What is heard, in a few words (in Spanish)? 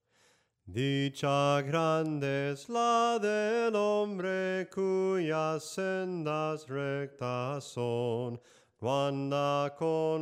SOPRANO CANTADO, himnos en melodía soprano; cantos del camino